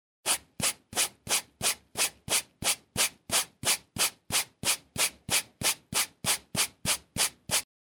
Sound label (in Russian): zvuk gubki